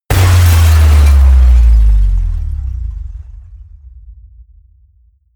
smash, baugewerbe

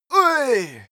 male hit

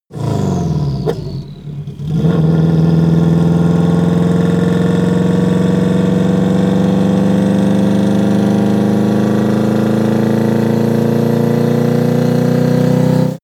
car vw t1 drive gear accelerate fast long

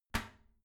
toilet seat lid close soft; haus-garten

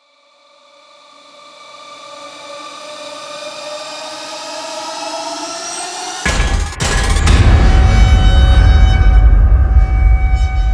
hit metal

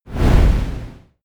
medieval map zoom in, swooshes swishes

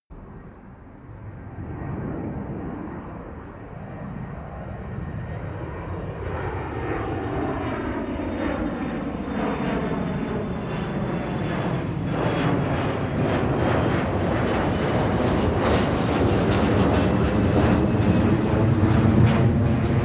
plane flyby land tonal; flugzeuge verkehrsflugzeuge; verkehr transport

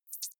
hover high, signale

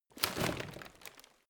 rotten wood handle; foerster

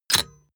metal clack lock

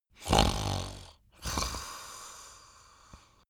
chattering snarl; koerpergeraeusche; menschen; soundpool

